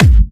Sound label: Bassdrums Clubhouse; Vengeance Samples; VEC3 Bassdrums; Clubhouse Kicks